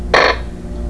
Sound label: Zap